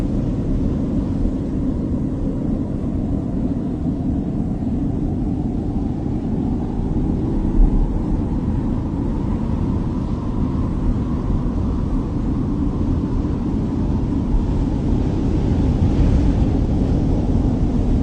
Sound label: wind slower; vanitas